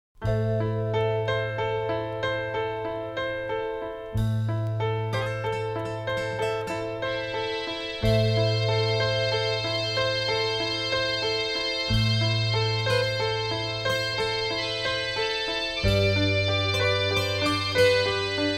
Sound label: sad melody